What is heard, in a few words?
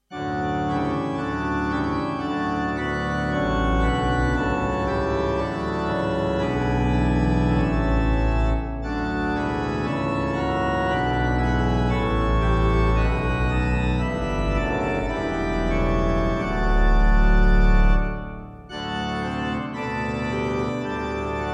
New Organ; Ein Feste Burg; Reger